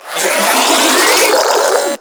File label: Spin Scream; Enemies; Abilities